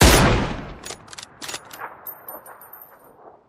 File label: Gun Sound; Gangstar; Gully Loops